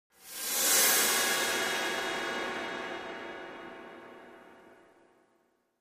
Cymbal Strike Brushes High Tone Whistle Impact Type 1; High Thin Impacts